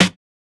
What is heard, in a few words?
Snare Hit; Hip Hop; 104 Cmin